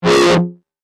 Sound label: Multimedia Negative, Technology, Multimedia, Negative, Element; Alerts; BlastWave